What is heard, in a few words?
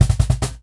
Cajon Fill; musicradar